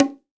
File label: BONGO, STEREO BONGO, Cuba; AKAI S6000 CD-ROM - Volume 5